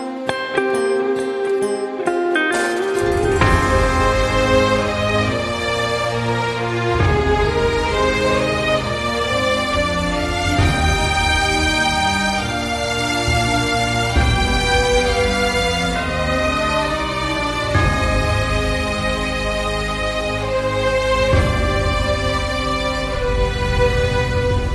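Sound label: background music Telugu Ringtone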